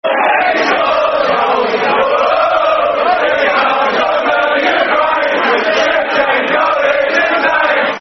He Comes From The Ukraine, Football Chants (Soccer Song); Chelsea Cfc